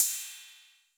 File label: Cym A 808 Sat Decay A, Cymbal, Multi Saturated